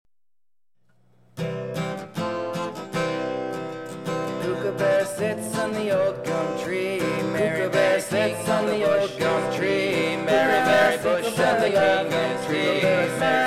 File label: Kookaburra (Round)